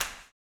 STOMPS; Spectrasonics; Vocal Planet; Blues Soul

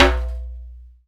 Djambe, Akai MPC2500

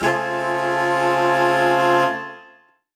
Horn Swell Alt Amin6maj7; undercover samples

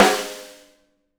LUDWIG SNARE

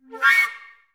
FL FX, Advanced Orchestra

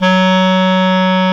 CLAR, Clar Jazz; Clarinets; Roland L-CDX-03 Disk 1

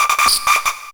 MORSE160, Hard & Loud Techno

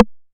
909 Rim V3 Orig; Goldbaby Tape808 & Tape909; The Tape 909

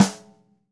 Ludwig Supraphonic Snare FF; 1965 Ludwig Supraphonic Snare One-Shots; Sonic Foundry (Sony Creative Software) - Vital Drums

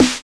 SD 909, TR-909; 90 s Sample CDs; Sound & Vision - Gigapack I CD 1 (Roland); TR-808 & 909

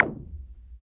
CYBER, Drums; Drums In Your FACE!; CYBER PRC

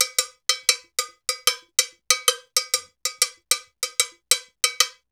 compasa, world dance; Spectrasonics - Supreme Beats

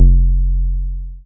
BAS SH-101; Best Service Gigapack 2

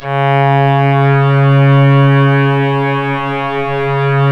C#, strings; Big Fish Audio

